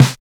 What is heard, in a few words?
OB-DX SNARE; Drums In Your FACE!; NEWJACK